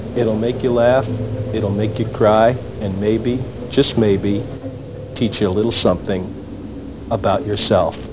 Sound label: laugh cry